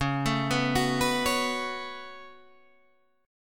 Db 7th Suspended 4th Sharp 5th, Guitar, Half Step Down; chord shape